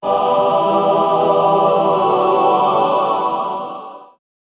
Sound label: church distorted choir fo